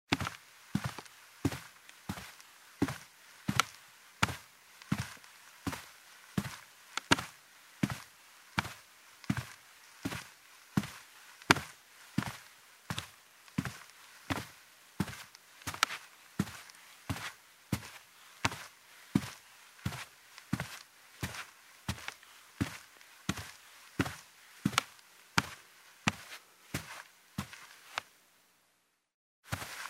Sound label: Steps in shoes on a carpeted wooden floor